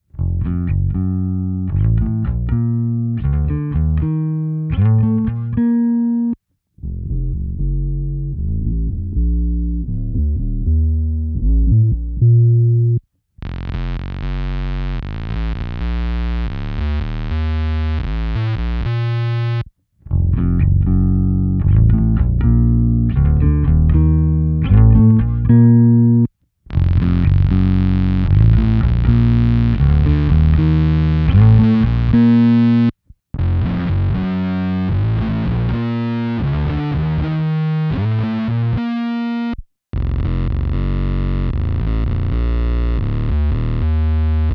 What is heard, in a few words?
boss oc2 normal vs squared, bass; BOSS OC-2 Synth Mod; the BOSS OC-2 "synth mod"